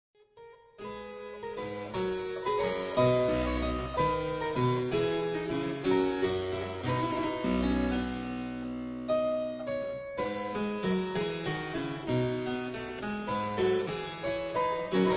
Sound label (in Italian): Spagnoletta, harpsichords